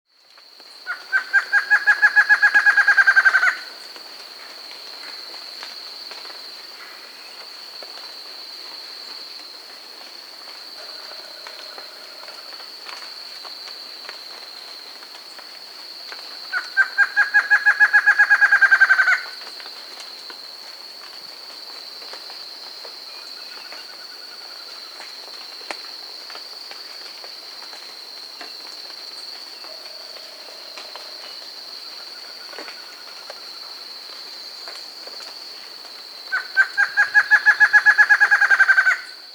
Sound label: Chestnut-Backed Antshrike (Thamnophilius Palliatus), Andean Birds; S. America